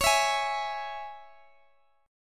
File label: Db Minor, Guitar Open G